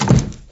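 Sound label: Wooden Floor Medium, footsteps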